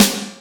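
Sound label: snare drum, Drum & Bass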